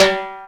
Timbale, Hip-Hop, Drum Kit

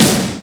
Snare; VEC1; Club

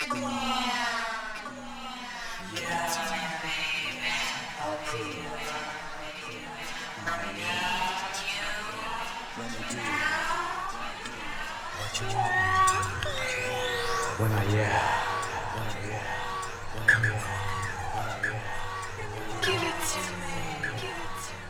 Vox Fx, Hip-Hop

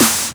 Snare; VEC1

